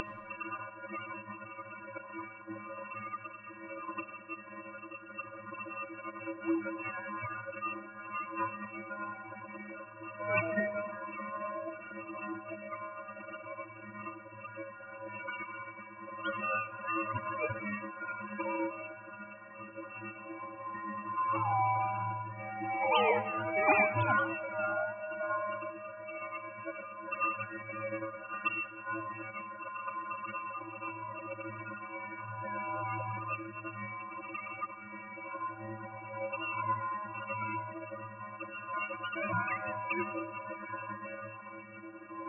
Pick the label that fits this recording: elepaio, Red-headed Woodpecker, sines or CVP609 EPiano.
sines